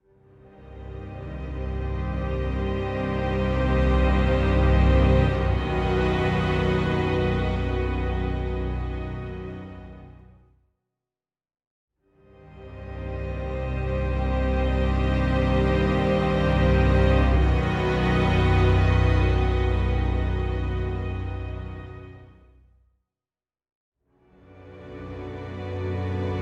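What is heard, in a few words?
ambient, soundtrack; vendriens well; low high; Tyranny Gold Edition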